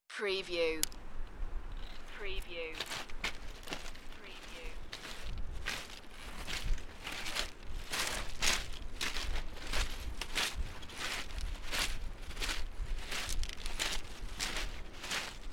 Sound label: Beach pebbles walking; beanstalk audio